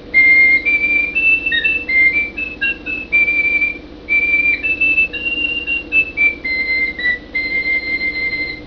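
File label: recorder garklein; recorder garklien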